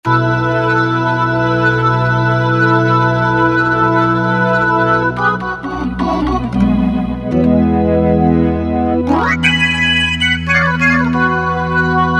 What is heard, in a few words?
Hammond Tube Leslie